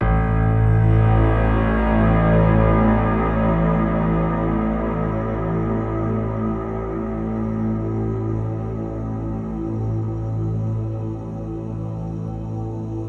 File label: Piano Hits Am 70Bpm; Construction Kits; STEMS; Ghosthack; SkyRealm; Kit 20 - Sea Of Souls